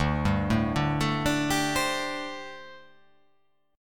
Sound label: D 7th Suspended 4th, down